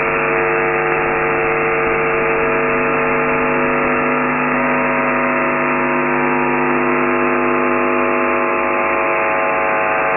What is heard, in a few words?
TV switching power supply on 14 MHz